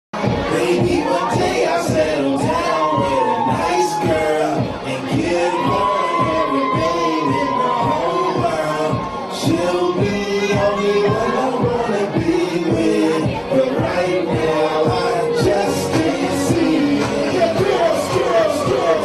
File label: music